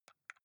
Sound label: console controller xbox arrow key press slow